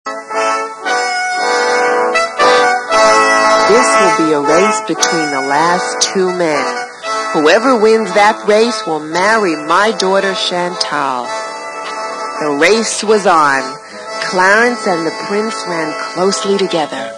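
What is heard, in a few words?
background music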